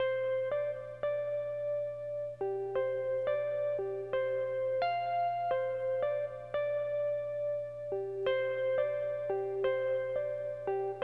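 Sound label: SYNTH, 87, L, Hip Hop and R&B; Best Service ProSamples vol.02; AKAI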